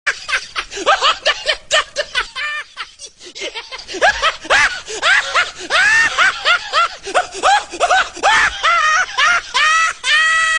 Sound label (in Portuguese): risada; Risada do Shino 2